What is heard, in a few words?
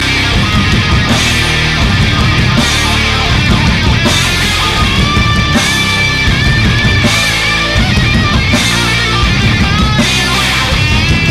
Pure Grain Desending